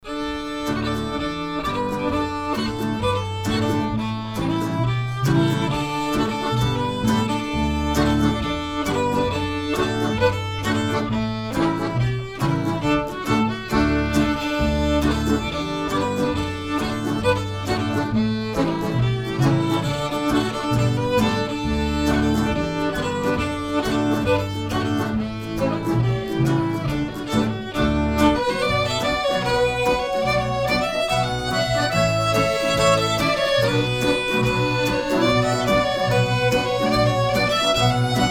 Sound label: scan quartet